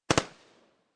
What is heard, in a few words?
M16 2 Bursts Barrel; War; VRSonic